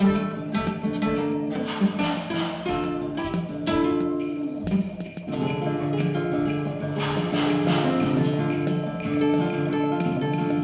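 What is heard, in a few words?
jazz, loops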